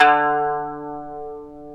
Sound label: ETH KANUN, Eth Plucks